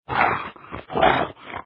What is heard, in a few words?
attack; Dark Village